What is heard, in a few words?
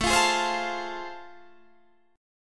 A Minor Major 7th Sharp 5th; AmM7#5; strummed; chordshape; 17,20,18,17,18,17